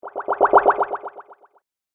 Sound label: Warped Water Rapid Echo Bubbles